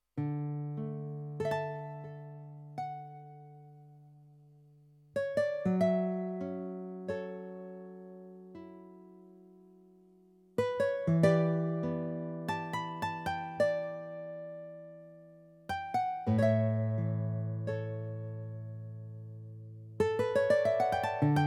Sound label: Guitar